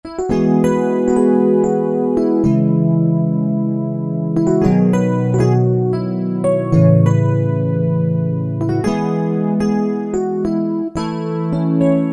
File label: Crystal Rhodes, Roland JD-990; Factory Patches